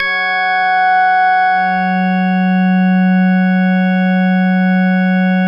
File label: Prophet VS, F#3, Vintage Keyboards; Big Fish Audio - First Call Vintage Keyboards